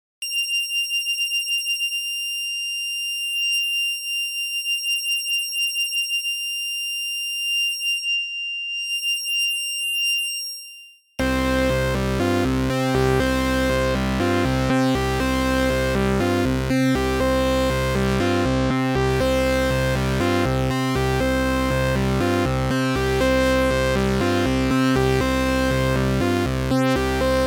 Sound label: multisequence; Xils; PolyBK3